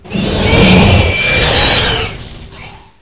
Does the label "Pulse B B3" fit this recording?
No